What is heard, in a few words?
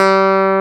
clav; FUNK